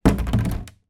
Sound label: household watering can plastic empty down on hard floor